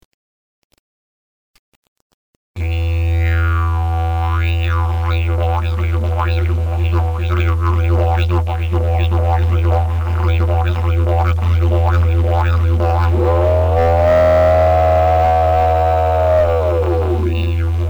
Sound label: didj